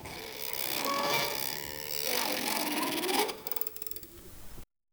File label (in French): une bille qui roule sans